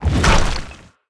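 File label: bugshark stone attack, monster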